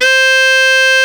Hard Baritone F#3

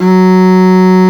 Arco Cello F#1

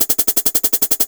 High Hat Foot

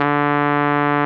Tuba F#0

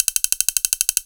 Drum Stick

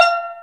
Steel Drum D1

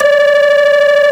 B3 Lo Dist Fast C4

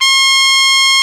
Soft Trumpet F4

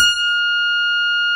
CP70 A4

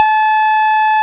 EP G3

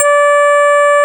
DX EP Gb3

